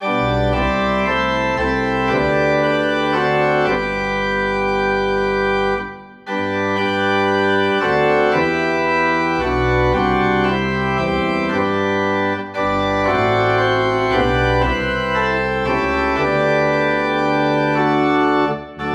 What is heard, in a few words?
Organ in G